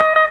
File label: GTR RIFF D#; E-MU Formula 4000 Series Vol. 1; Hip Hop Nation; Hip Hop Kit 2